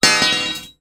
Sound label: impact metal bar hit place down heavy; zapsplat